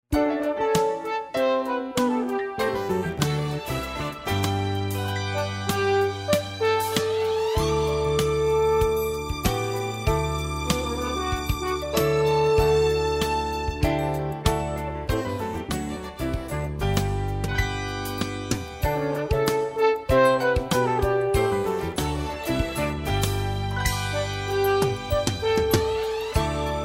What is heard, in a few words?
Promo Bed